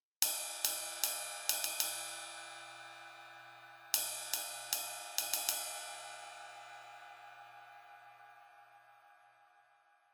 patron ritmico

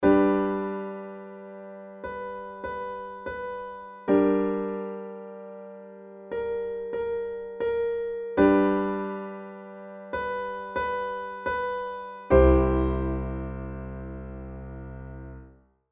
sin voz

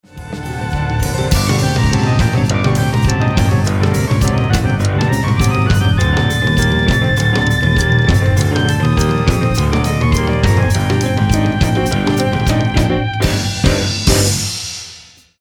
Adagio Maestoso Gaita de Tambora

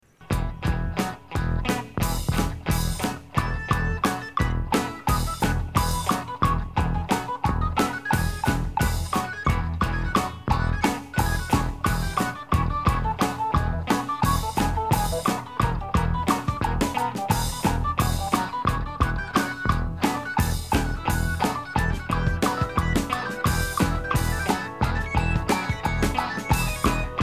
primer solo organo with woodblocks; Apocalipse

